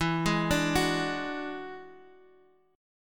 Gb 7th Suspended 2nd Sharp 5th

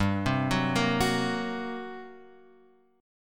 G Minor Major 7th Double Flat 5th down, chord; arpeggiated; JGuitar

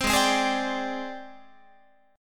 G sharp Major 7th Flat 5th Guitar; G#M7b5 Chord

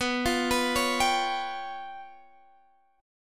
C sharp 7th Flat 5th B

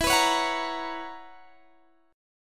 B Major 7th Suspended 2nd Suspended 4th E

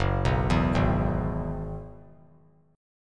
F sharp 7th Suspended 2nd Suspended 4th; F#7sus2sus4 arpeggiated

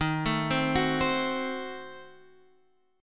Eb Diminished 7th Eb, Guitar Standard; Ebdim7 arpeggiated; 11,12,10,11,13,x; JGuitar

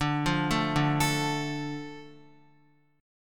D Minor D Guitar; Dm arpeggiated; x,5,5,2,2,5; JGuitar

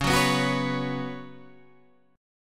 F sharp Suspended 4th, C sharp, guitar chord

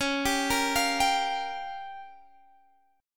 G Minor 7th Flat 5th C sharp

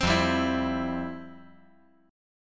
F Major 7th Suspended 2nd, F, down, guitar

